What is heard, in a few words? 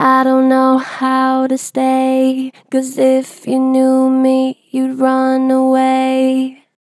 I dont know how to stay, Vocals; Pitch Flat Gentle; Harmor Vocals